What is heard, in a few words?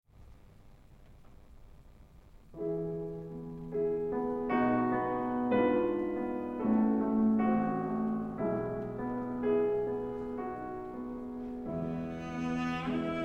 Sonata in A Minor Adagio Allegretto LIVE; Schubert; Arpeggione